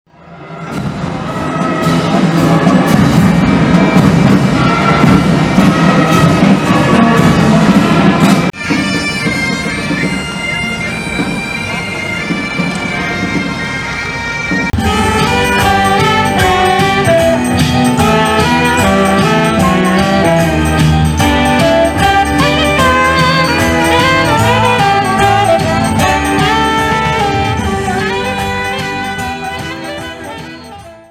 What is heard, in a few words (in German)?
Chlauseinzug musikalisch